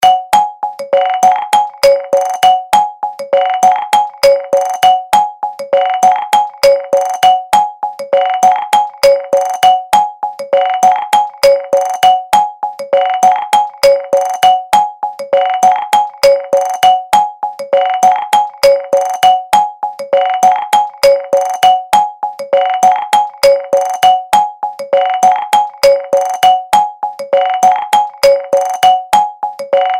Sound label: iphone ringtone; Simple Ringtone; رنة هاتف عادية